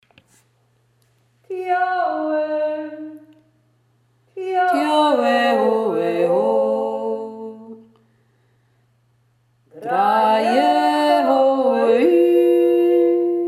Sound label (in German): zweistimmen dioe dioe